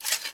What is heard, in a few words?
put back sword; Mount&Blade Warband